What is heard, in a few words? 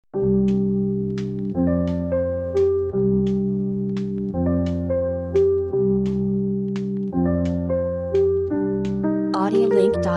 trip hop